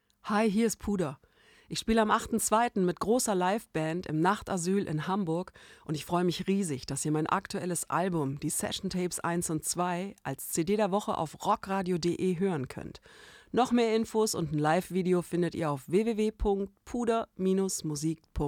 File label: jingle